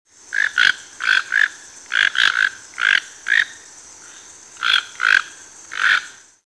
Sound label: toucan; pakshi